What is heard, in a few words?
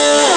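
guitar fuzz; Amiga Soundtracker Sample Packs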